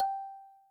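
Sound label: Kalimba, Yamaha DTXpress; 300 Drum Machines; Percussion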